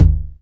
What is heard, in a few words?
909 HI TOM